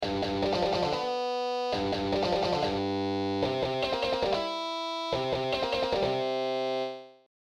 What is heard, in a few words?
Riff; Blues Solo